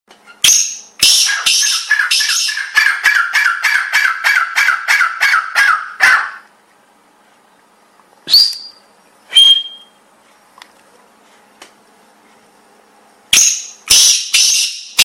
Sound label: kala teetar; birds ringtones